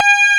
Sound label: COMP ORG SEQ; AKAI S6000 CD-ROM - Volume 1; SAMPLE WAVE MIXING 1